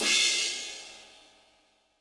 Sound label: Deep Cymbal; Yamaha DTXpress-II